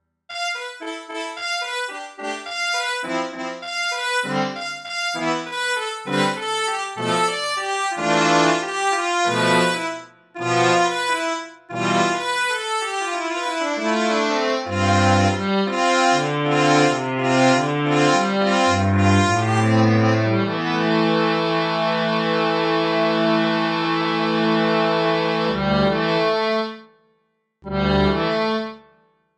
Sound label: Big air Brassorgan dry; Reeds